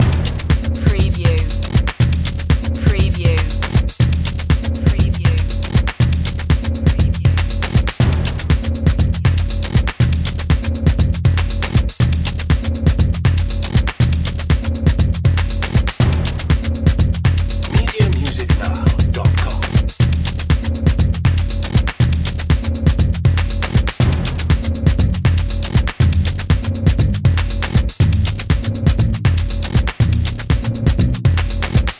voodoo dance loop

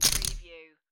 Poker Chips Rattling

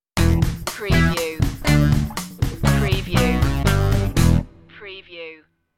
funky strut stinger

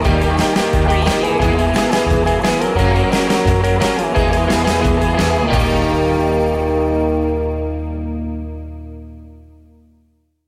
storm surfin stinger

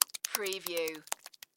Blood Drips Thick; sound-effects-bible

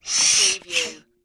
Bee Smoker Pump; sound-effects-bible

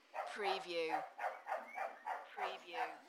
Dog barking in distance; beanstalk audio

